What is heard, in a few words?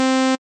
Sine Saw Square Saw, Synths; Multisamples; Ableton Live 12 Lite; Core Library